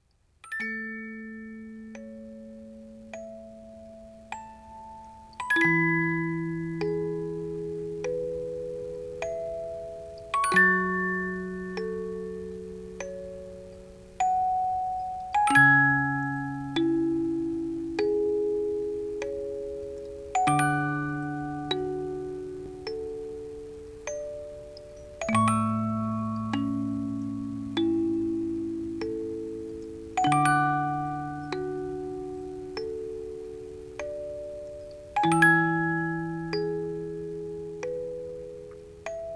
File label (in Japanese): canon dekirudakeyukkuri, musicboxes